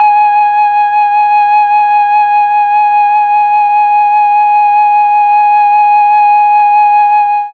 G#3 TRON FLUTE, Mellotron; Mike Pinder - Mellotron Archive CD

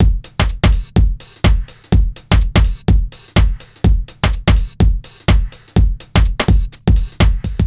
Full Loop 125, Loungin House; Equipped Music

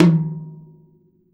TOM, DRM KIT; Jazzistic

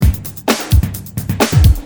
Drums, Perc, Industrial

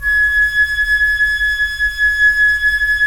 FLT FL, Flute; Roland - LA Composer vol. 4

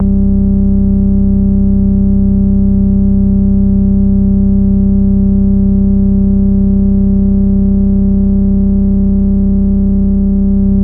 Pad C0, Prophet; Worra's Prophet ProphOrg; Oldies

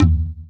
EQ Perc, Percussion Mix

